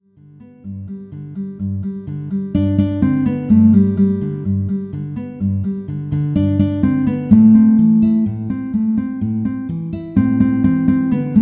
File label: synth